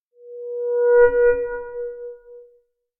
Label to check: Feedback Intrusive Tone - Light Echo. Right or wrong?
Right